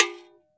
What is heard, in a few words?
AFRO AGO, AFRIC AGO GO